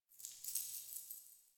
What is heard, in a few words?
Idle Head Shake; Ambience; Warframe; Entrati; Tagfer